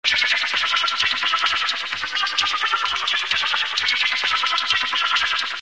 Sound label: shooting